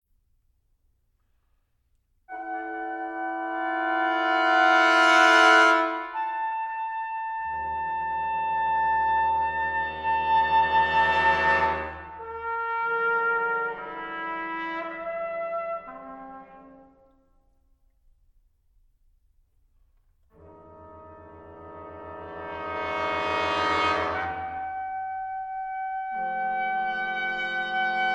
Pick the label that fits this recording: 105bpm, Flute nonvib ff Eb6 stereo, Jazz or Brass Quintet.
Brass Quintet